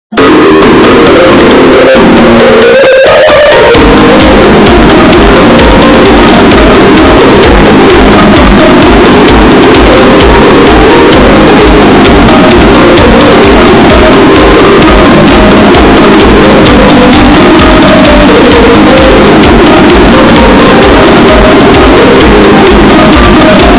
ringtone very loud

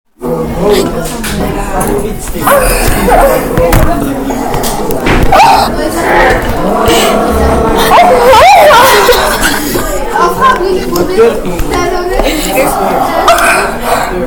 china girl ahh

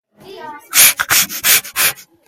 sniffle sniffle

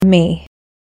trill

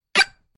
fire ball

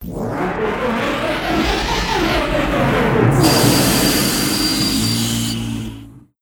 engine fail

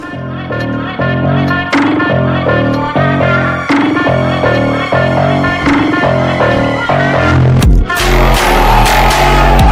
epic phonk

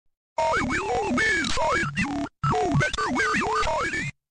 angry

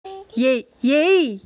yay yayy real voice

